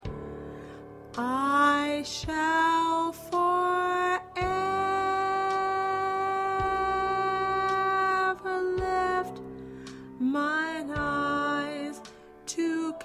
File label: Leads